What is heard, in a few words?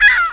Seagull